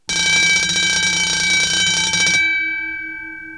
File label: bell